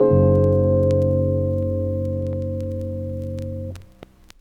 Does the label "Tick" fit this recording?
No